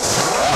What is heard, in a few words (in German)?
ROBOTE; Best Service; Advanced Mega Effects